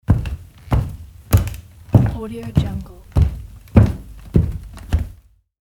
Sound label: male walking barefoot on wooden floor